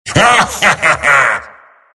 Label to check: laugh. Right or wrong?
Right